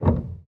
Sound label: piano release; samples-piano